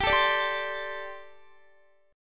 G Suspended 2nd Suspended 4th G, Guitar, 4F, 4A, 5C, 5G, 5C, 6E; strummed